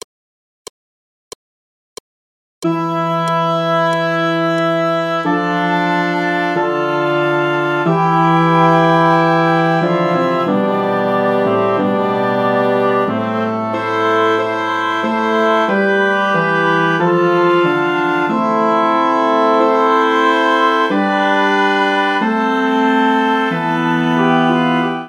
Mille regretz all; Josquin

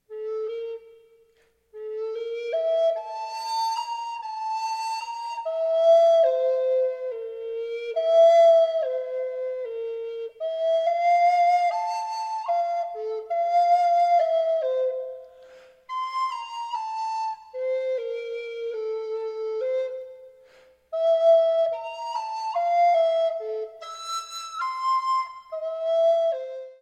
Haiku in Morse

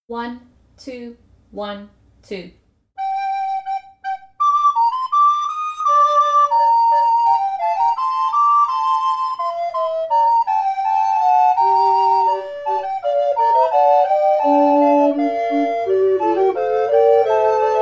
Fantasy Prima FULL CONSORT, recorder; Banchieri

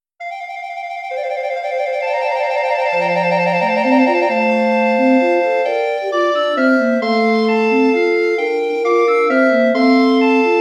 Quartet for SATB Recorders

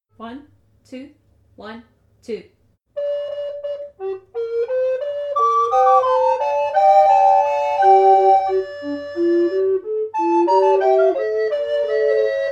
full consort; Banchieri; Fantasy Secunda

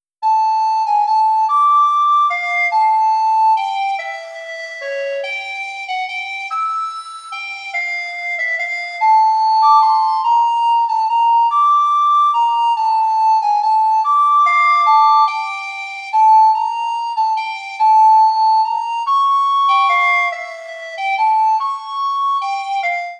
Siciliana for two alto recorders

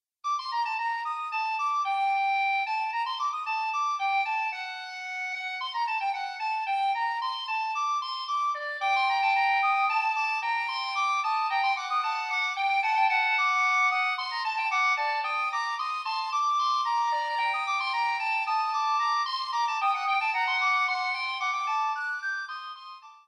Canonic Duet in g minor, recorder

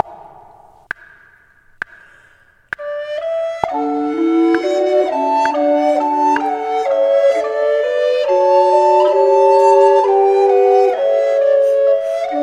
Dumbarton's Drums all voices, recorder; Barsanti

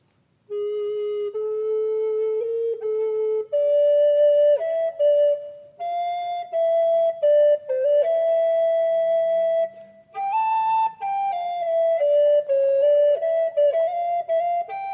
Canonic Duet two voices, recorder

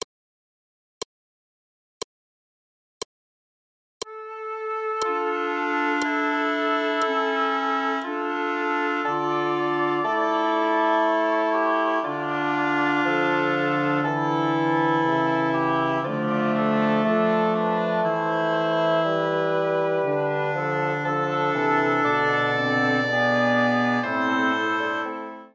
Paduana a 5 all; Schop

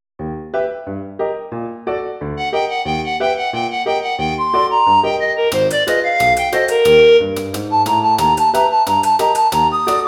Washboard Rag, recorder

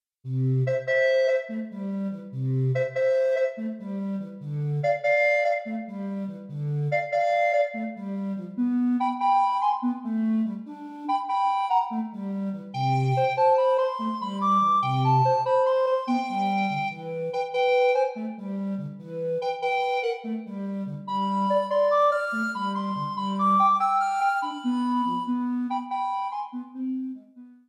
Syncopated Melody for 3 recorders

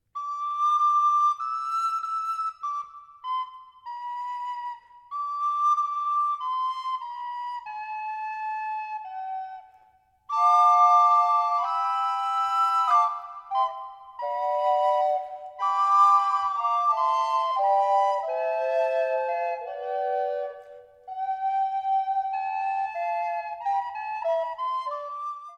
Bergamasca SATB, recorder; St Pierre

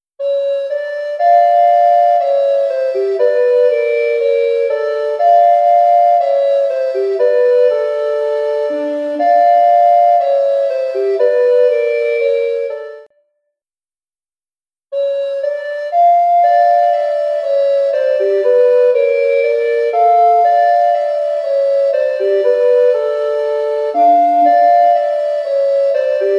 Duet for Tenor Recorders both parts; Zoom

